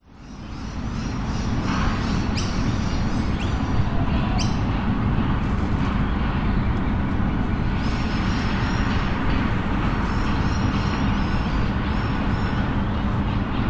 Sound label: brisbane cbd botanic gardens birds distant city hum construction